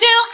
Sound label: vocal